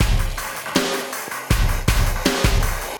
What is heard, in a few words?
CB LOOP; Zero-G; Chemical Beats